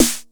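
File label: snare, EMU Orbit-3 drum machine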